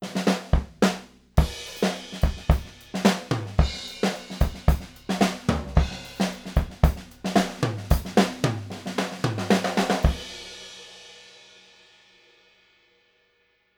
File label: Apex 205 Mod Full Kit, ribbon mic; Modded mic – Full kit